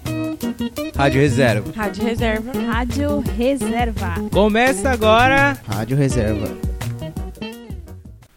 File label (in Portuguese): vinheta abertura